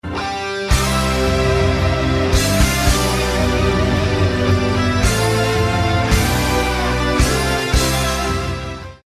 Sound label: Victory Short